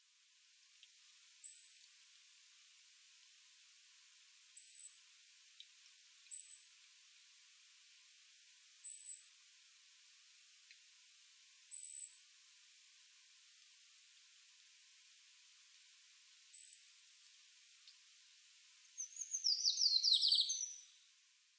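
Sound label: Eurasian Treecreeper; kibashiri; furano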